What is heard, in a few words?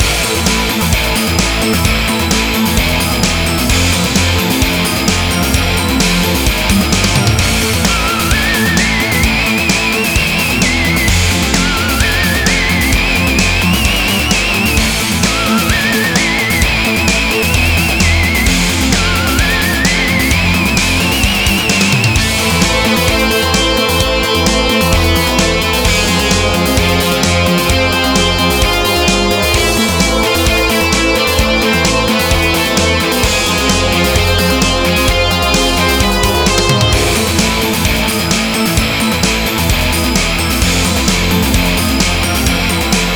haunted loop, zombie soundtrack; unreleased